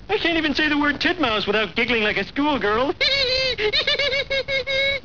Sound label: TITMOUSE